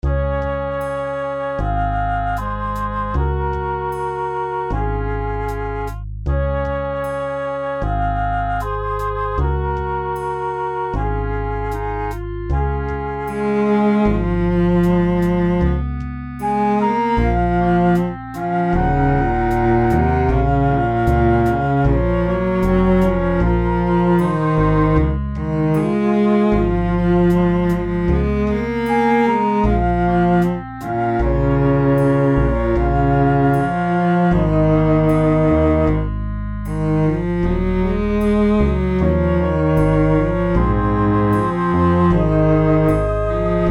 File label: relaxing